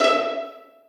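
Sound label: 2nd violins pizzicato e5; Sonatina Symphonic Orchestra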